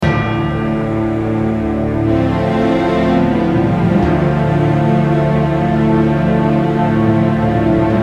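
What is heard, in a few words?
Short RPG, BGM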